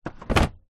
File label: vehicles scooter moped seat drop down